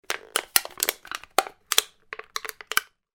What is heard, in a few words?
crushed can